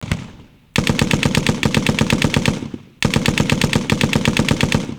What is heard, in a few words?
machine guns, military; Greytsounds VOL-1